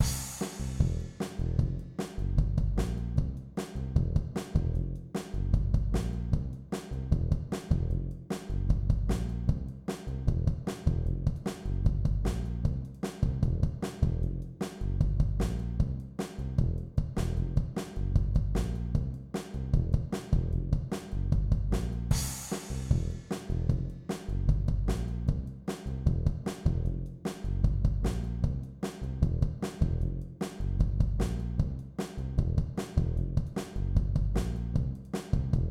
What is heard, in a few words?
straight 8th beat